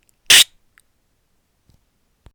manta ray sound

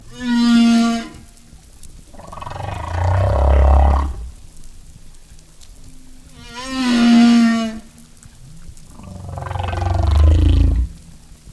big singer

